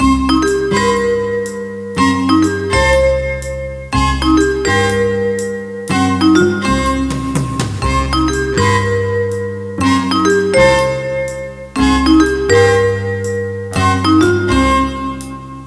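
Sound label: jazz loop; avr loop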